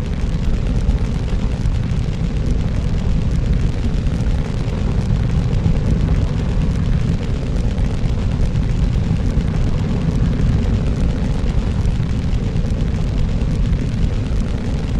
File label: flames loop; Wizardry; ebwizardry